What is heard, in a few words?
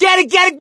scream while pounced; voice; source engine; left 4 dead 2; survivor; gambler